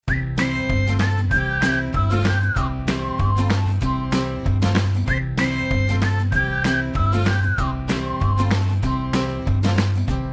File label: jingle